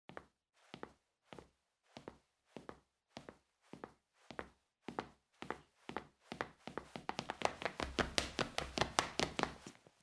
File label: LINOLEUM Running Shoes with Cotton Clothing